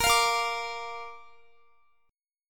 Bb Major Bb down Guitar; strummed

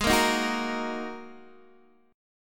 G sharp 7th Suspended 2nd G sharp Guitar DADGAD

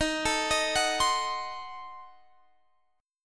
Eb Diminished 7th Eb, Guitar Drop D

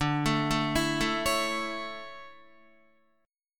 D Major 7th Suspended 2nd, Guitar; arpeggiated; JGuitar

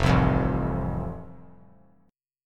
F Major, F Chord; strummed

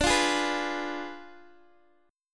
D Diminished 7th Guitar DADGAD, chord

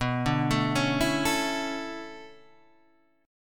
B Minor 11th B, Bm11 chord; arpeggiated; JGuitar

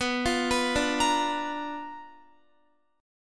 B Minor Major 7th Flat 5th Guitar; BmM7b5; arpeggiated; x,14,15,16,14,17

